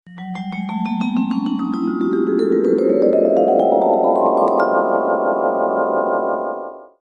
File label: Meditation Bells Alert